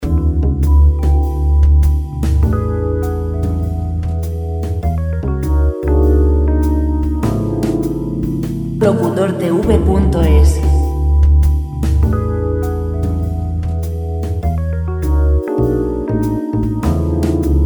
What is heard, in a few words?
100 jazz, piano